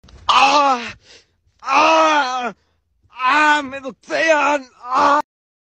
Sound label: ahh ahh ahh me doxean ahh